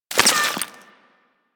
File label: Bullet Impact Debris Rock; Warframe; Weapons